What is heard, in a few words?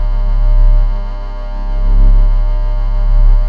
control room tone; gesource; DuClare Chateau